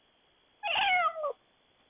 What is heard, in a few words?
cat meow